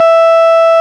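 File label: RMI piano E 4, EP; Sonic Reality - Vintage Timetraveler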